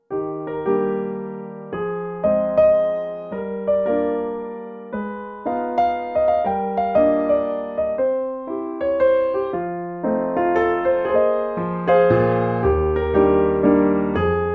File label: piano ringtone